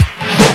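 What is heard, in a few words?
reverse snare; fairlight cmi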